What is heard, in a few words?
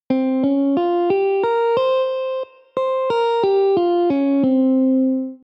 Insen; scales